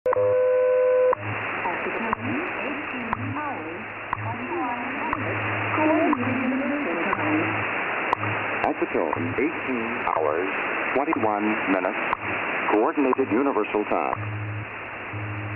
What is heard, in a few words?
wwv wwvh